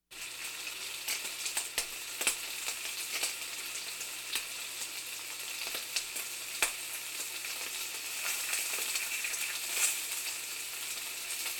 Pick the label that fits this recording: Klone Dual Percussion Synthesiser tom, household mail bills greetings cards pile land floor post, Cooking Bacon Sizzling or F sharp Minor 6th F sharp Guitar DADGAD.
Cooking Bacon Sizzling